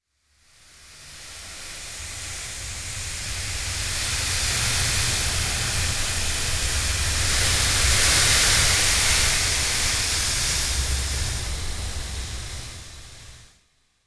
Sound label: breeze through the trees